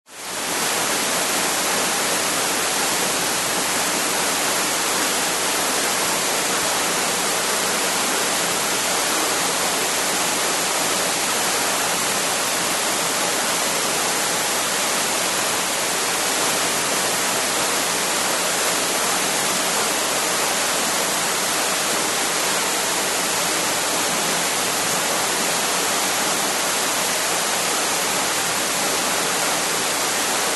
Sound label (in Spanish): naturaleza para relajarse